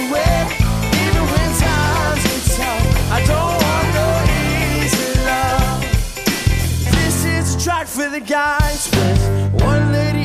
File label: acustic, ringtones